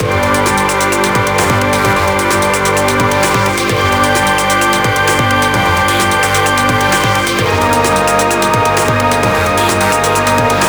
Arcade Menu; Nanostray 2 original soundtrack

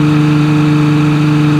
Audi R8 Plus Low; car simulator 2

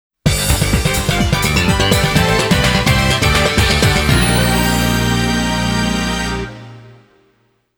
Fan Fanfare (Stage Clear); music from konami arcade shooting cd box

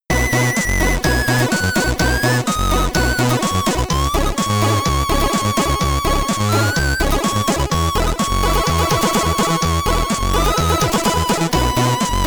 SID Stereo, commodore 64; Eskimo Games; Ice'n Igloos

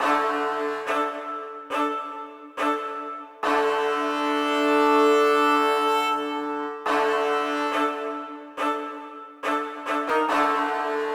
Theme Accompaniment; The Witcher 3 Wild Hunt; Q403 Council Gasle

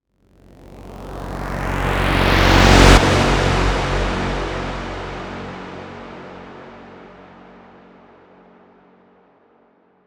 hugebuild 172bpm; chicory a colorful tale; ps4

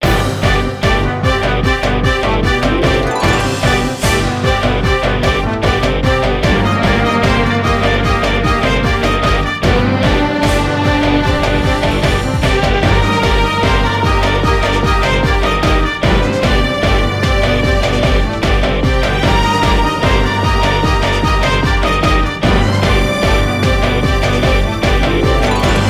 Grand Prix Victory (Win); Speed Racer The Videogame; PS2; Wii

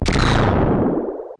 charge up fire; gonner linux macos ps4 switch windows xbox one gamerip 2016

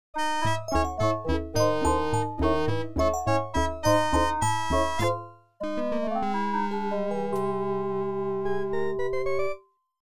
Gameover

